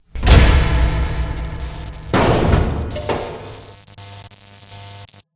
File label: break; Five Nights at Ronald's